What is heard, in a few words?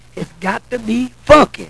bfunk